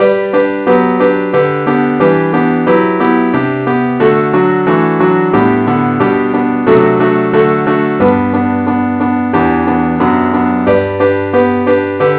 keyboard only, key G

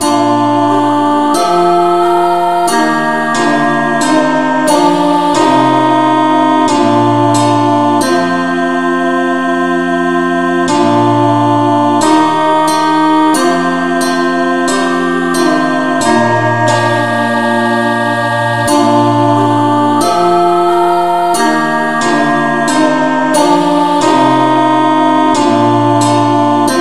revnovocal keybes

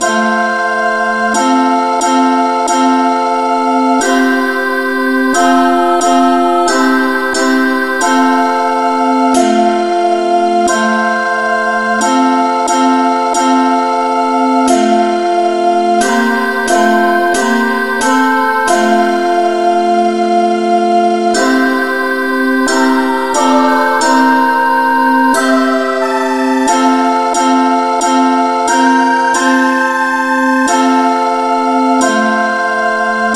no vocal key f

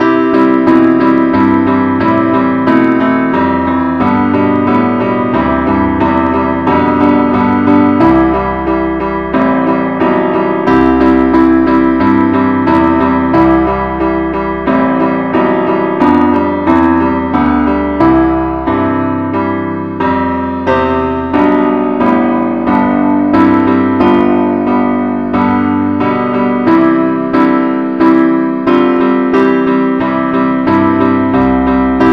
keyboard only key C

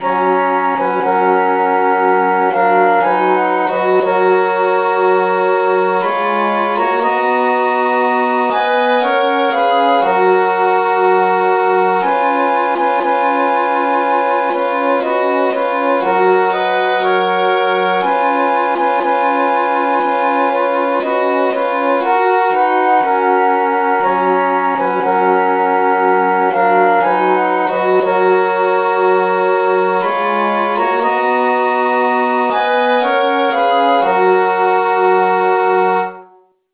traditional key B

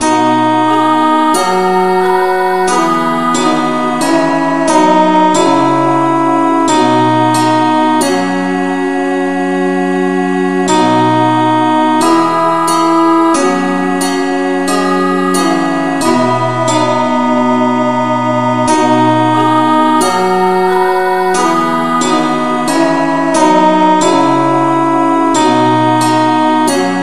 no vocal, key B; Gwalchmai; Glory be to God on high

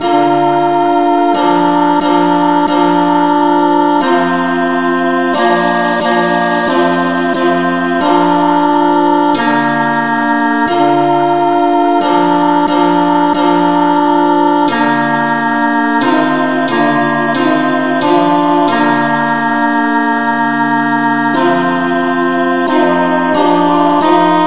no vocal key bes

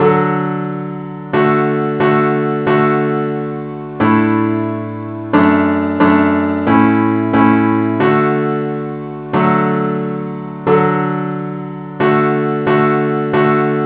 parts only, key D

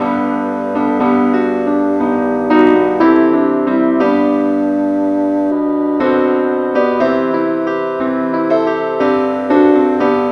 keyboard key C

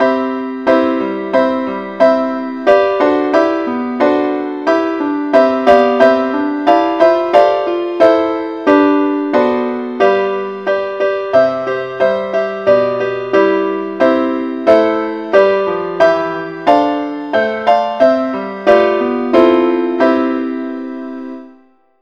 keyboard key c; saintthomas

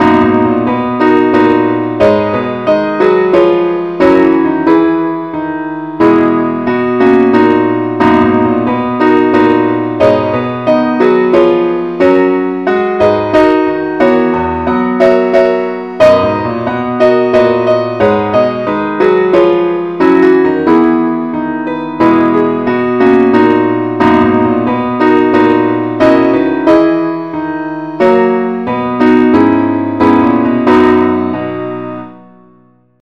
keyboard only key b

